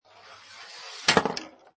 Pinball Sound Ball Rolls On Rail And Falls Off End; Video Games